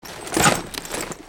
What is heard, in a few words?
spike strip impact; gow; fortifications